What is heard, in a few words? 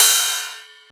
Ride; FLPRO